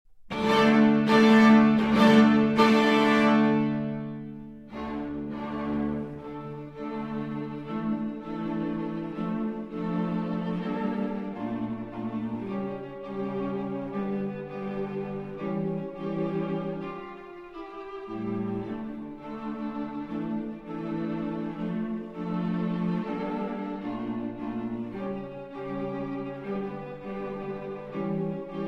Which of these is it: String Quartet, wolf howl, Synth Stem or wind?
String Quartet